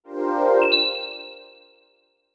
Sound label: LOGO; Inazuma Eleven GO Galaxy; 3DS